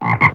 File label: Frog Croak; Hub Mansion